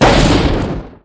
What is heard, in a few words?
lightbolt